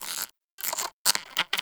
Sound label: Ext Insect, Ambience